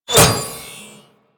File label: Kunai Impact Default; Warframe; Hit Effects